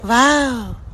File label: wao